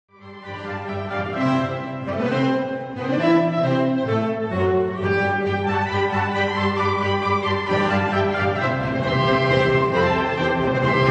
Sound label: concerto oboe wind ens